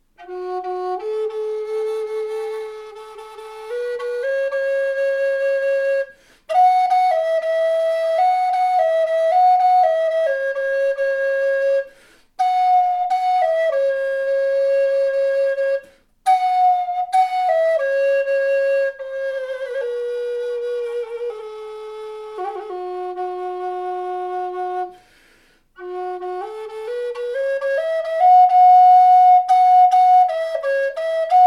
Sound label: Native Flute Fis